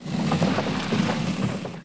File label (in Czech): Odsouvani